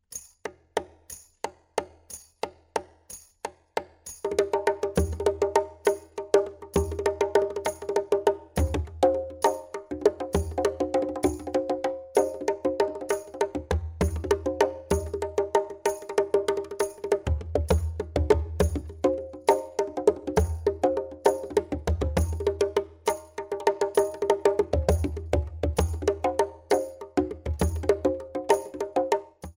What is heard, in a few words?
body drum